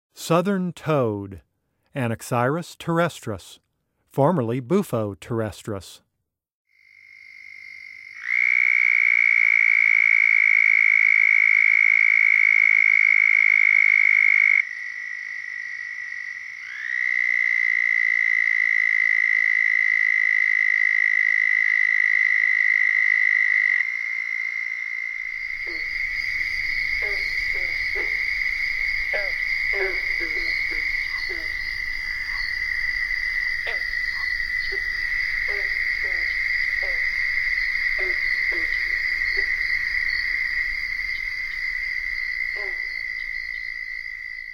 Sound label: Southern Toad Call; frog friday